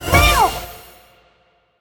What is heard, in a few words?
cat pink; netent; copycats